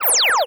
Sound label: Blaster Fire, cartoon Foley Fx; musicradar; cartoon caper